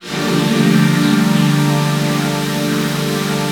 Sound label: PAD, Drum & Bass, 155 BPM; Ueberschall; Drum & Bass Resonance